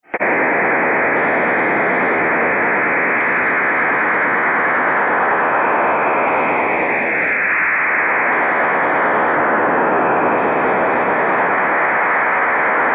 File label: unknown fsk ofdm